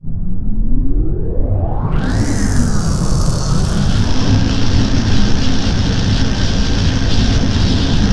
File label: silo power; Dystopia